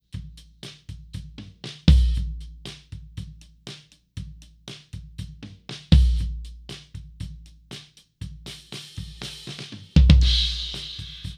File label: Floor Tom